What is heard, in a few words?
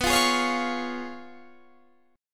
Db 7th Cb, guitar; Db7/Cb strummed